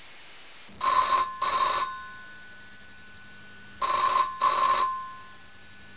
phone; de poty

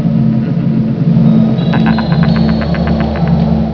laugh; freddy

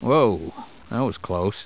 close; city scope

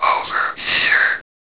whisper; escape series; escape3

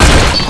banhammer hit wall

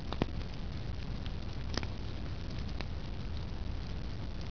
flame sound

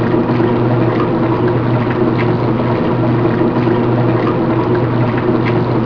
washery

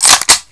mp40 draw slideback, weapons; svencoop; ww2projekt

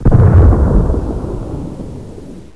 big gun

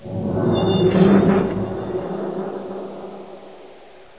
creak; dm borehole

